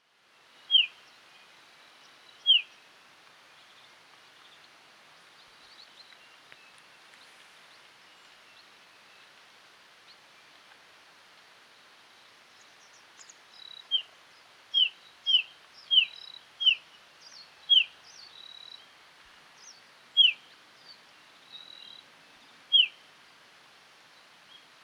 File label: Pied bush chat